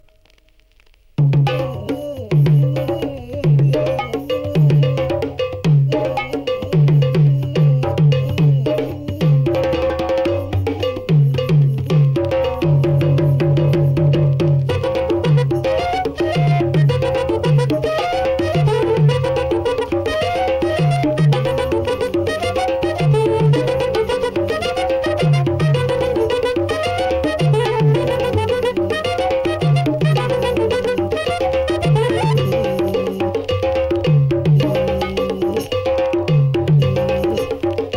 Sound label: Candombe percussion